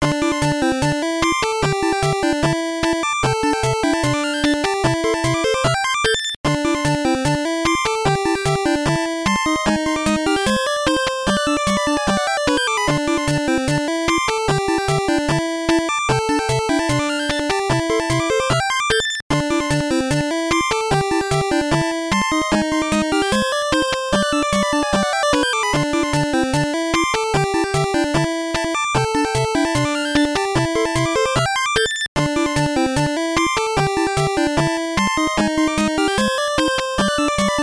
BGM; Rainbow Islands; GBC

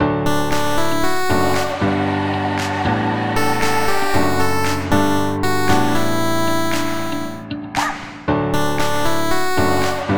Menu; Turbo Pug DX; Preach the Pug

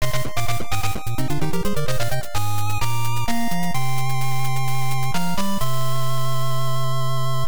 Area Clear; Shatterhand; NES

